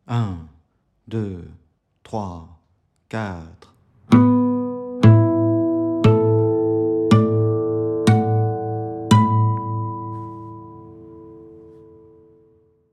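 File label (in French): harmoniques 7e frette 060 bpm, guitare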